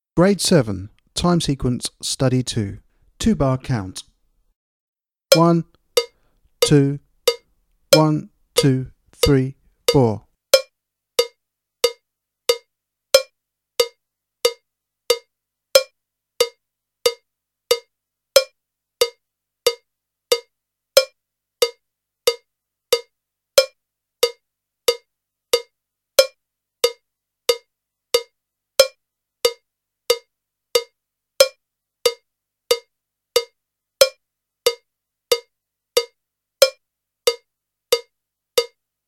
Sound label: Click Track for Time Sequence Study, drum kit